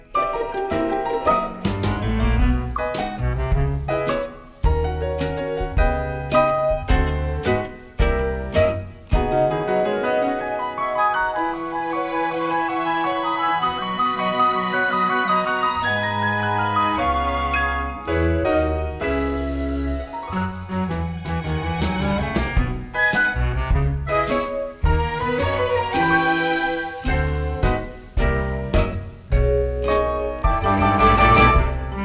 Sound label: audition accompaniment